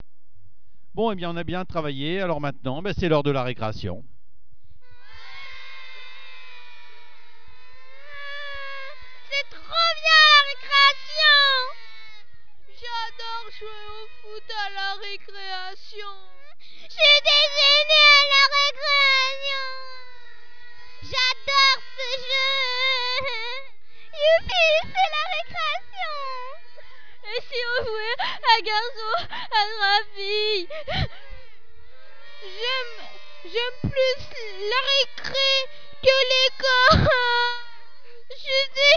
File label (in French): pleurs recreation